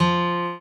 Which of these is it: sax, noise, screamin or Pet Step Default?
noise